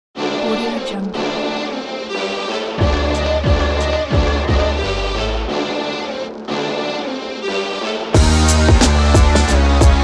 eastern hip hop; studiobell

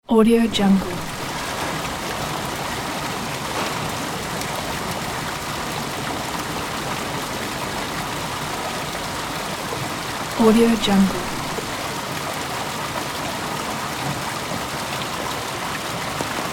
fountain

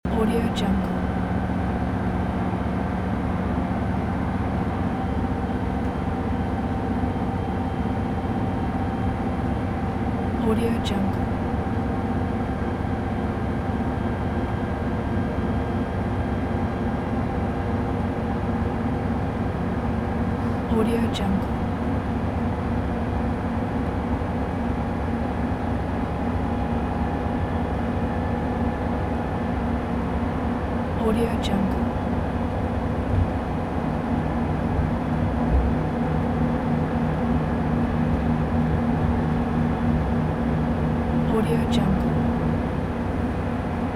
bus driving on highway interior loop; fxprosound

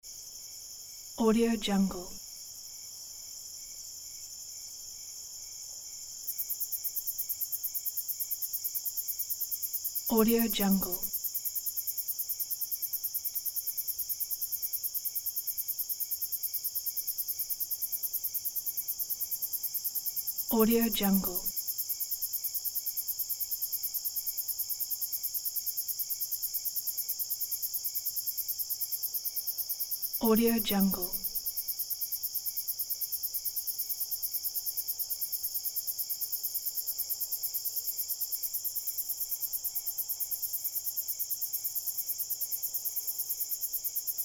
crickets; motifes7

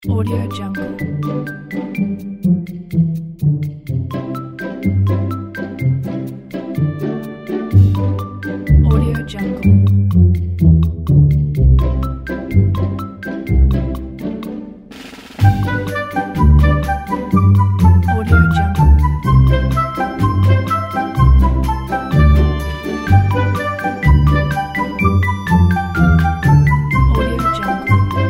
comic book background music; marketofmusic

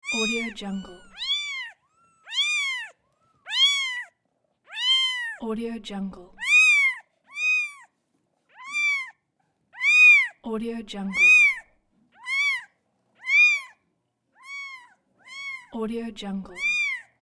kitten; justsoundeffects

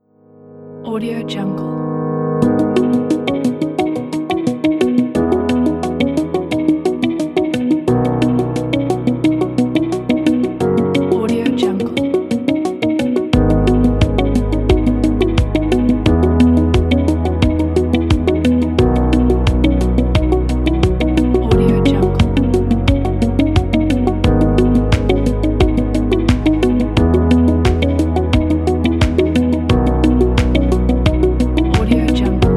commercial uplifting company background; bestandbless

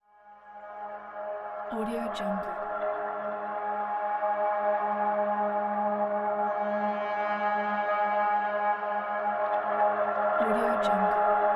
ambient horror cinematic

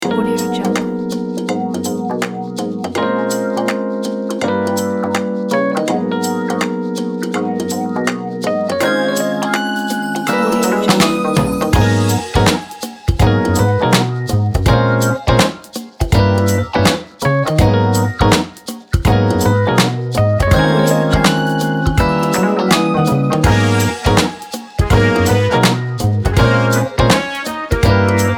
modern neo soul rnb groove; fancywaves

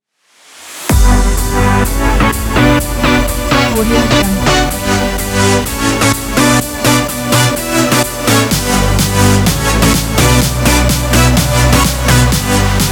uplifting pop; triadastudio

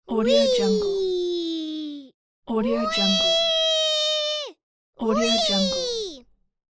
little boy wee shout

